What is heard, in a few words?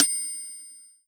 Kawai K5000W Ding